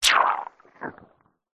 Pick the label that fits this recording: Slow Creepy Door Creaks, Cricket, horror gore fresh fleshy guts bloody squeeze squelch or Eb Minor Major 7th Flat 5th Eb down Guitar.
horror gore fresh fleshy guts bloody squeeze squelch